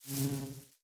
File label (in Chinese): 蜜蜂授粉声